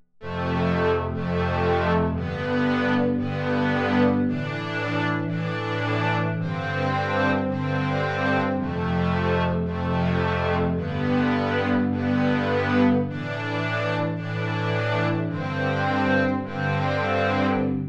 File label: strings, ambient